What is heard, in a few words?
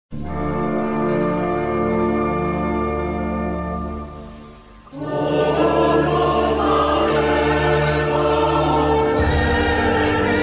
Hatikva choral; israel; anthem